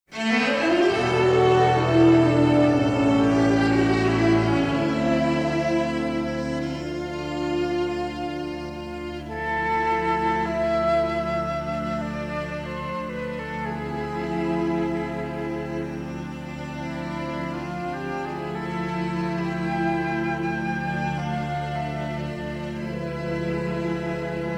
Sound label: Lonely to Dramatic library music; sttos soundtrack